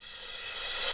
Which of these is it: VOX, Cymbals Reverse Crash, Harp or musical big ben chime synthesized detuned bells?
Cymbals Reverse Crash